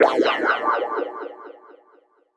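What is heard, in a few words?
deep house FX, 125 bpm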